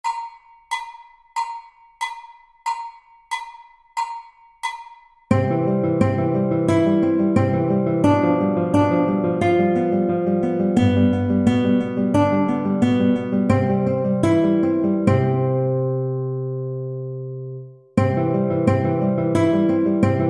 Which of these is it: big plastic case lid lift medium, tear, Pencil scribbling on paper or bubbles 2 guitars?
bubbles 2 guitars